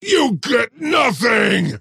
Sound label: deny pain; axe